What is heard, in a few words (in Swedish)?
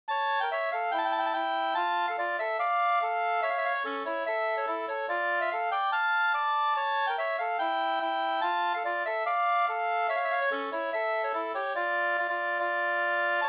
reinlender